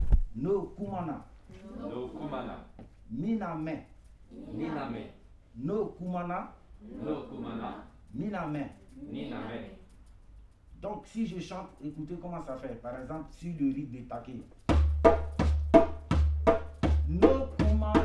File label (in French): Chant en dialogue; art africain